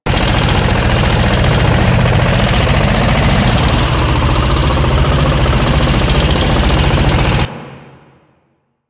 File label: Fueling Station Generator Loop; Ambience; Props; Warframe; Corpus Railjack